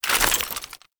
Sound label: Bayonet Melee Whoosh Gear Accent; Warframe; Tenno